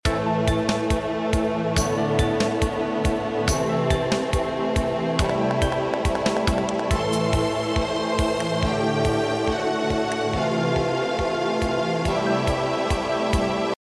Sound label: menu loop; BecherCraft; Playito games